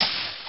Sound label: door open; federation